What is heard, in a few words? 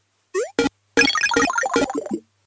Tower Destroy